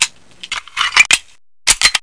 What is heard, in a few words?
reload pistol